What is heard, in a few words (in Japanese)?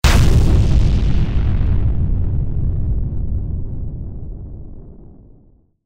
爆発; 武器効果音